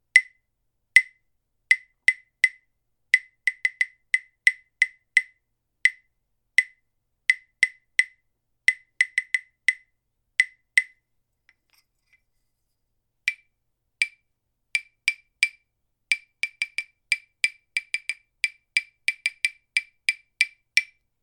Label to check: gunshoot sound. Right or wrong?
Wrong